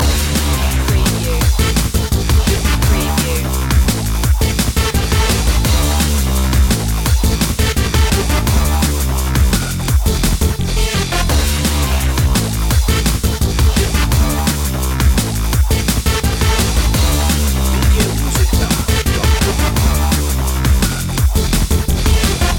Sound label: stomp loop